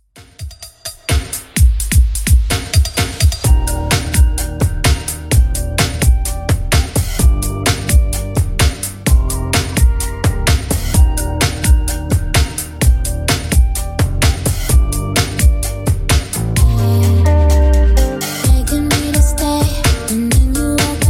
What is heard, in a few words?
Top40 128bpm